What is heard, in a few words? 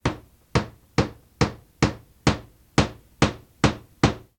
chopping; pyntnclick